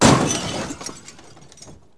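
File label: large break, destruct; Wired for Sound Pro CD; Aristosoft, Inc.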